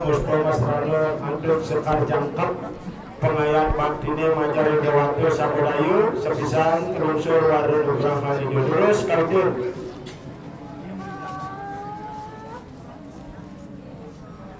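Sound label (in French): Indonésie Padangbai fête de Kuningan transes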